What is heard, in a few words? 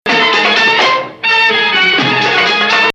Hijaz